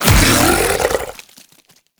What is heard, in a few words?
Devour Regurgitate Impact D; Warframe; Grendel